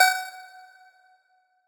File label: Riddim Melodic Hit - F# Sting; One-Shots; Melodic Hits; Ghosthack; Riddim Essentials Volume 2